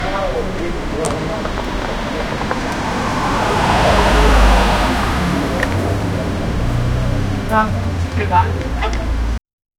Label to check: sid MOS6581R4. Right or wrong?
Wrong